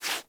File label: Foley Idle Paw Swipe Quick; Warframe; Kubrow